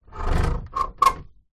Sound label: household old window wooden open rub scrape